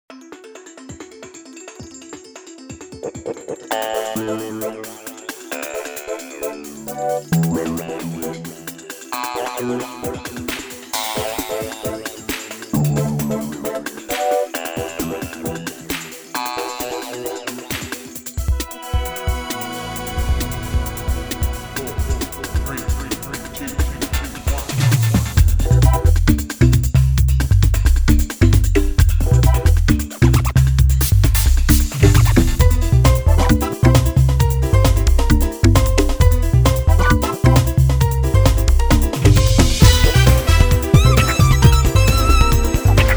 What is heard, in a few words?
tek salsa